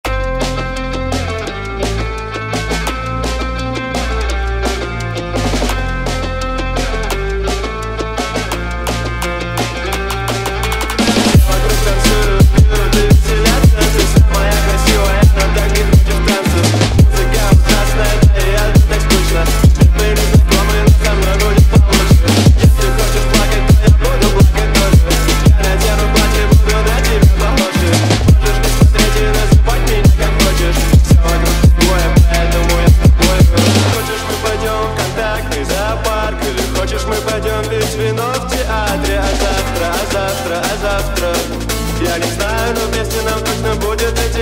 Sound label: phonk remix